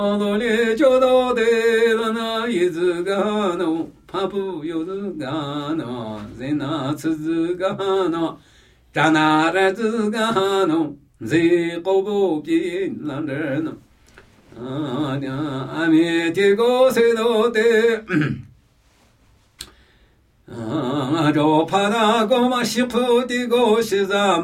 Namuyi Tibetan Ritual Chanting; Phi tca pi su

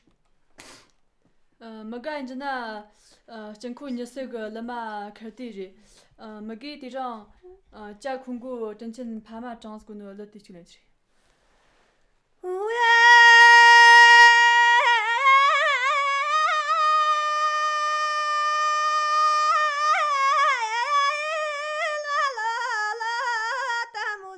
Dkyil 'khor Folk Song

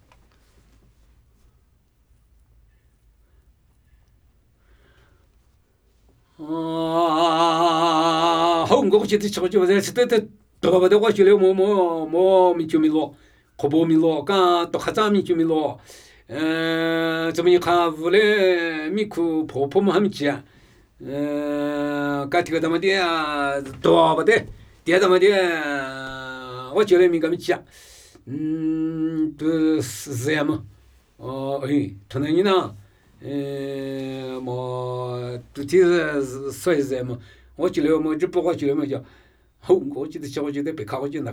Namuyi Tibetan Ritual Chanting

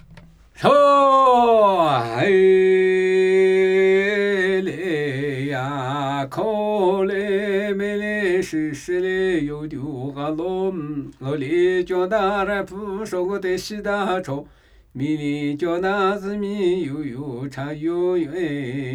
Namuyi Tibetan Ritual Chanting

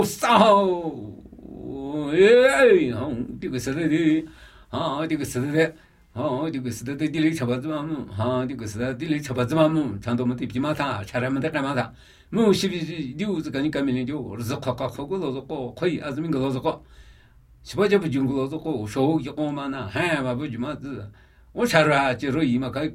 Namuyi Tibetan Ritual Chanting

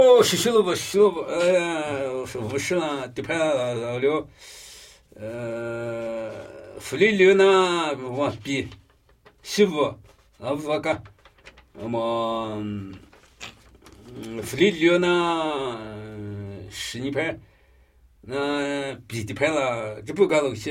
Namuyi Tibetan Ritual Chanting

